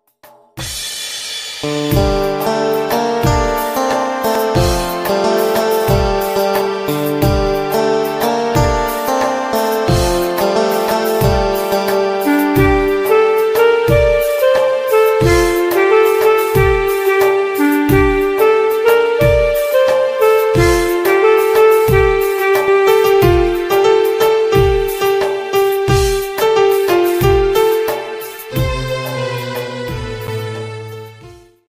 instrumental ringtone love song

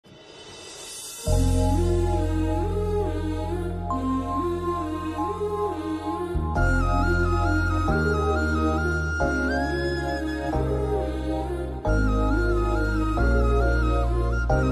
love flute bgm ringtone; the warrior movie; ram potheneni; krithi shetty

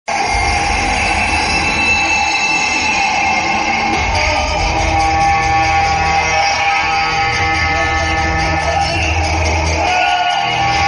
interval bgm ringtone; atithi devo bhava movie

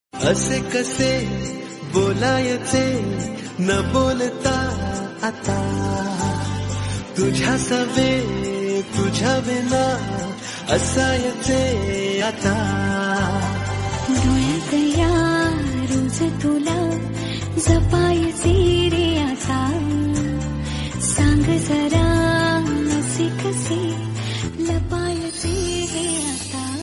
marathi love song ringtone

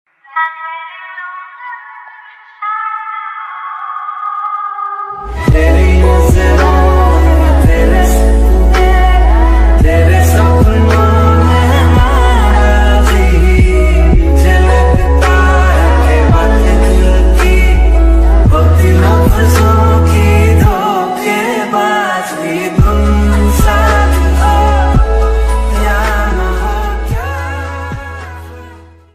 call tone ringtone sad ringtone

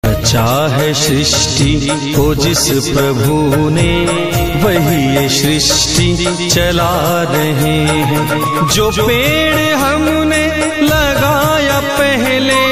ringtone devotional ringtone; sri krishna

